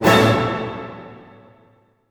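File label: A major fortissimo, orchestra hits; Sound On Sound - Peter Siedleczek's Orchestra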